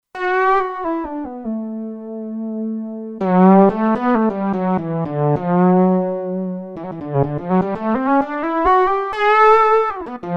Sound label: Fretless Lead, Kurzweil K2500X; Factory Programs